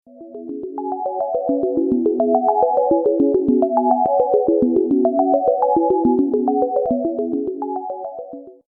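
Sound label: cz1000 arpeggio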